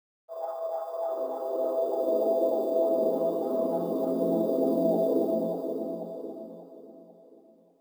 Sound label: hollar